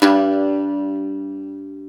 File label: SAZ G3, Plucks; Eth Plucks, Pluck Duo